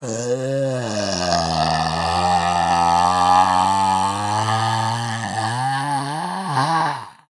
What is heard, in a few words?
Horror Monster Growl Long